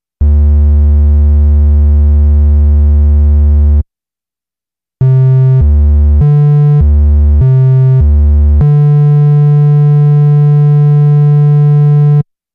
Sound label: Nord Lead 2 Raw Triangle Wave; Nord Demos; Nord Lead 2 versus 3